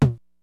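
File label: 909 House; DRUMS + PERC; OTHER DRUMS; Rubber Chicken Software Sample Bank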